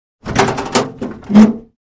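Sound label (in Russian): дверь УАЗа открывается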